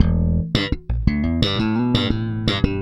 Slap, Bass; Bass X

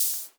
M500 HH, Drums; Best Service; Dance Mega Drums; MAESTRO

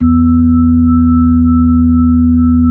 B-3, Brite; Keyboards of The 60's and 70's

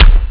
Bass drum; jazz jackrabbit 1; M8

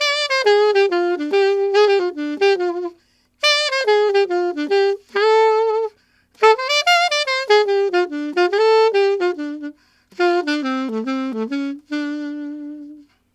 Alto County in D min, Sax; Sonic Foundry (Sony Creative Software)

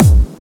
144 BPM Kick 4 4; Vengeance - Essential Clubsounds Vol.5; Flight Kit Root Amin; Drums & Perc

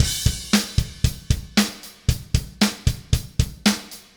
Beat Crash, Indie Rock; Haze; Sonic Foundry (Sony Creative Software); Indie Rock Anthems; Session 06 - Haze

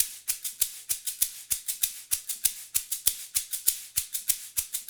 Smooth Groove Shaker, 70s Analog Funk; Sonic Foundry (Sony Creative Software); What it Is!

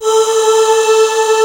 TREMOLO STR MIX, Strings; AKAI S6000 CD-ROM - Volume 1

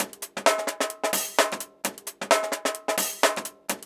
130, DRUMLOOPS

